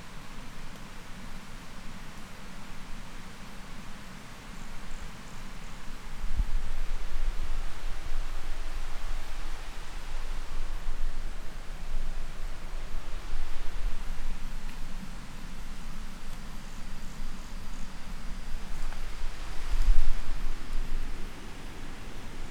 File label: Brachycephalus sulfuratus Reserva Particular do Patrimonio Natural Salto Morato